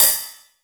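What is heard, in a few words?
open hi-hat, Drum & Bass; Ueberschall; Drum & Bass Resonance; 150 BPM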